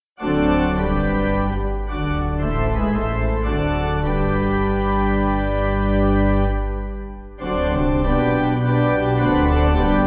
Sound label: Pipe